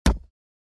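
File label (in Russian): удар человека о машину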